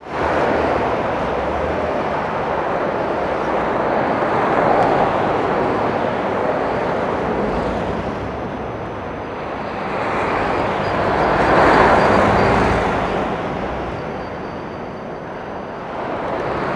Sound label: TRAFFIC IN, TOWN FX; USB Soundscan vol.02; Underground Hip Hop; AKAI